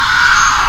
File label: spinner spin; Senko-san-Hideru